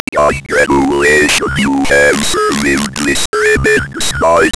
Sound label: Game Over